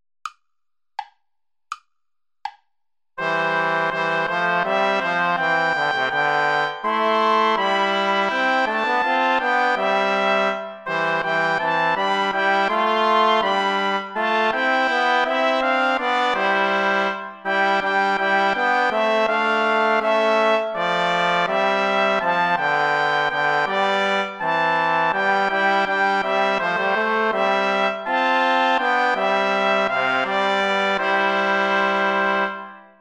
play along without trombone; praetorius michael; herzlich tut mich erfreuen

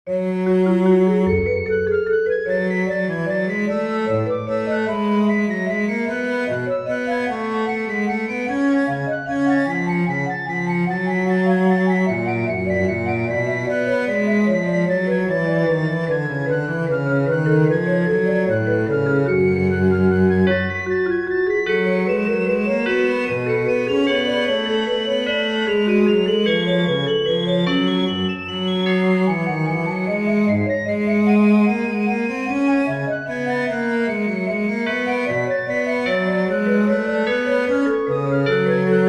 chorale for piccolo tubular bells marimba; cello; bach johann sebastian und was der ewig tig gott

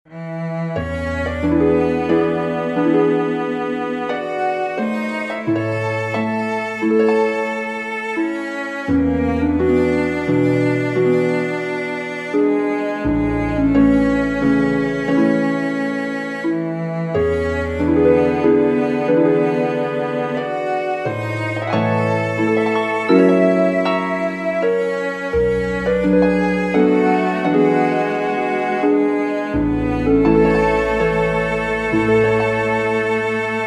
prelude major for cello harp; chopin

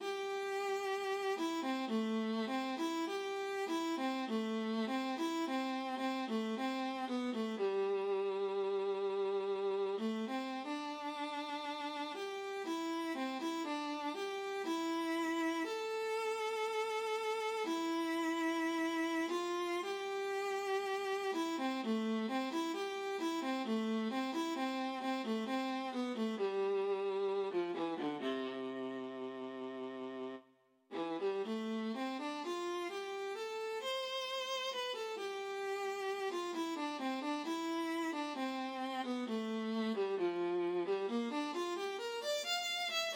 for viola harp

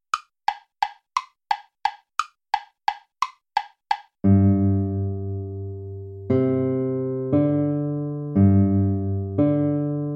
toy play along

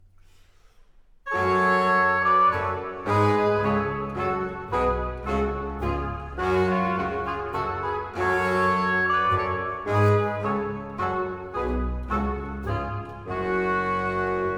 menuet; haendel georg friedrich

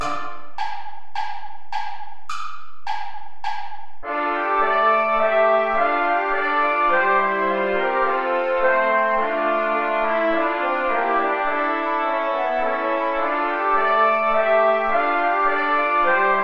play along without trombone; bach johann sebastian; ich steh deiner krippen hier

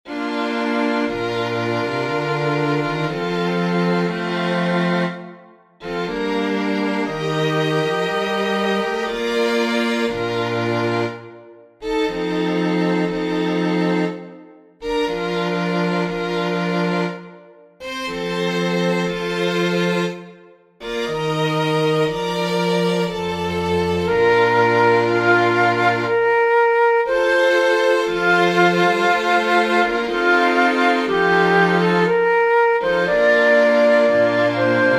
for flute strings; vivaldi antonio; vanum est vobis ante lucem surgere from nisi dominus

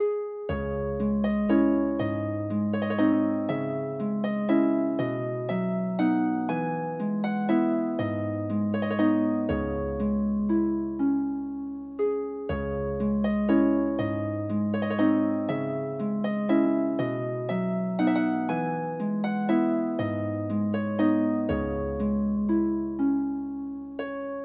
harp

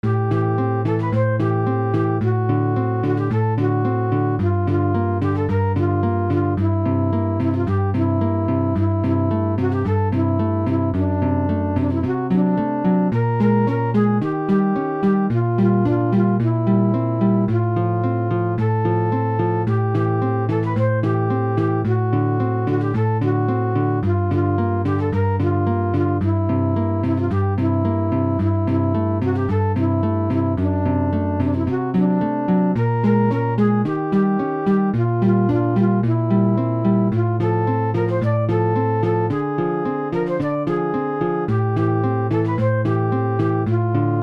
antique duo

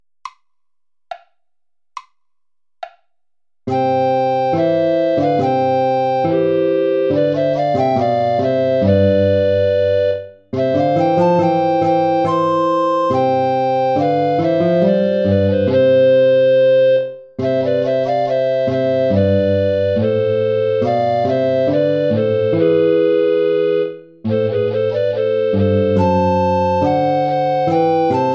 play along without tenor recorder

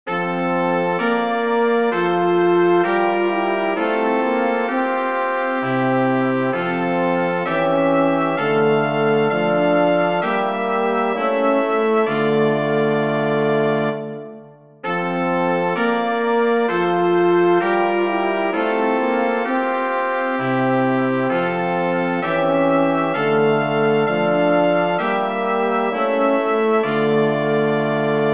chorale for brass quartet; bach johann sebastian; die seel weia hochzuscha tzen

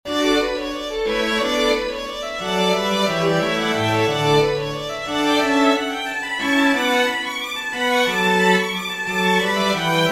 sinfonia for string quartet; haendel georg friedrich; from giulio cesare

